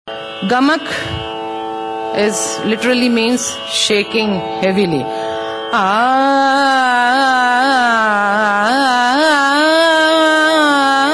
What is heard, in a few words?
gamak